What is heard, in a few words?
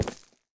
step, deepslate; block; minecraft